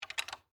Door Latch Unlock, Foley, Door, Latch, Unlock, Door, Glass, Window; Door Closing; BlastWave